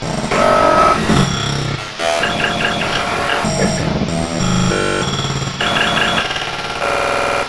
computer broken; Quake 4 Alpha; id software

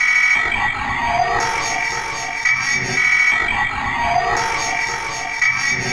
computer alarm; Quake 4 Alpha; id software

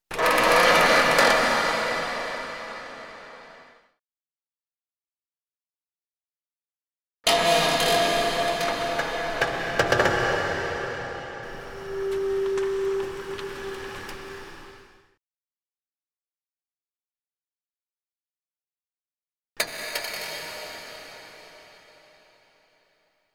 metal mix; DoomRTX; E3 1; Sweetners

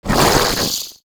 imp attack melee, monsters; Doom

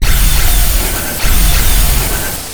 valkaryne run; Quake 4 Alpha; strogg

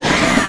imp pain, monsters; Doom

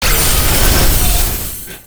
retch melee attack; Quake 4 Alpha

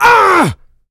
pain large, player; big

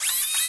Pinky servo; DoomRTX

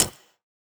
retch step; Quake 4 Alpha

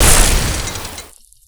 retch fire; Quake 4 Alpha; id software; strogg

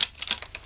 Shotgun turned on side; Foley; DoomRTX

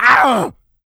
pain large, player; DoomRTX; huge

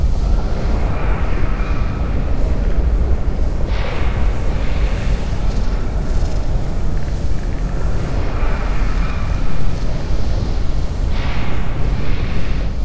cryo big creaky mach; Quake 4 Alpha; id software

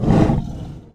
breath, strogg; Quake 4 Alpha; id software; tik

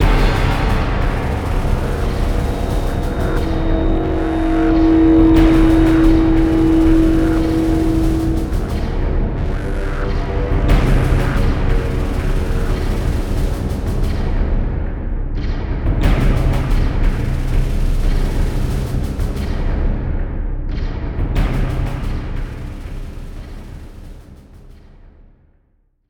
music; Quake 4 Alpha; enter prison

